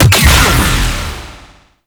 Sentient Marrow Tick Death Explosion; Warframe; Tau

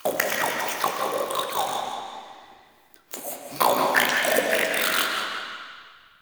Root Cave Creature Snort; Neathuns

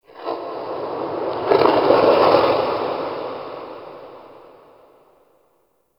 Cold Texture, Crypt Ambience; One Offs; Champion Crypt

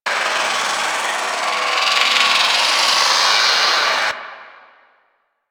Smite Projectile Loop; Warframe; Warframes; Paladin

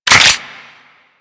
Lever Action Pistol Reload; Grineer

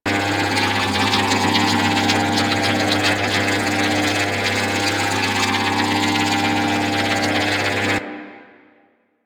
Electrical Generator Loop; Ambience; Props; Warframe; Orokin

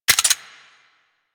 Electro Mag Mech, Weapons; Warframe; Corpus

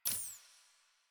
Pistol Laser Aim Exit; Weapons; Warframe; Tenno; Lasrian

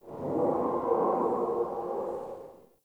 Cave Ocean Animal Very Far; Ambience; Midrath Island

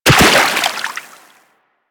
Land Soft Water, Footsteps; Warframe; Operator

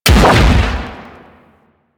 Sapient Pistol Explosive Impact; Warframe

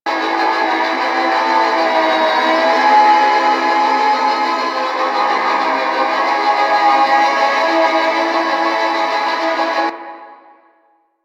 Cinema Ambient Movie Music Loop; Ambience; Props; Entrati; Warframe; NineteenNinetyNine

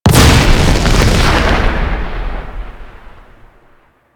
Jet Pack Active Explosion; Warframe; Grineer Jet Pack Marine

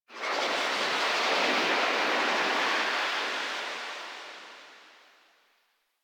Wind One Off, ambience; Warframe; Sacrifice; Vitruvian Memory

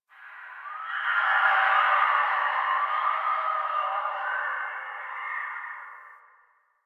Distant Infested Screams Winter; Ambience; Warframe; NineteenNinetyNine

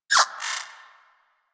Power, Akimbo Pistol; Grineer; Prisma Twin Gremlins

